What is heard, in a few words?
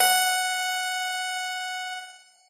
piano